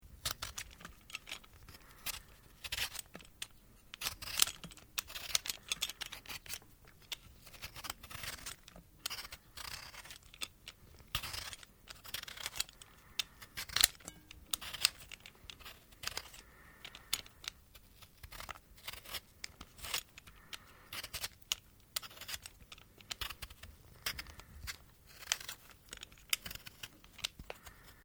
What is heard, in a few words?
POTATO PEELING; Various food preparation; Restaurants